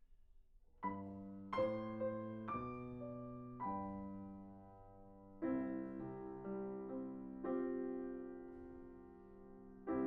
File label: PIANO